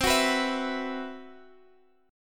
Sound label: C Major 7th Flat 5th, chord